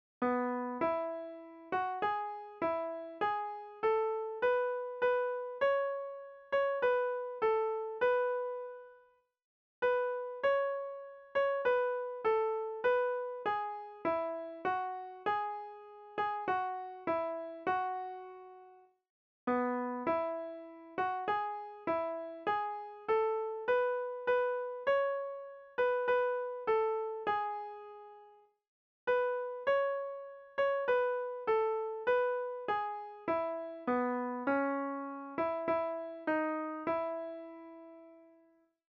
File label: hymn melody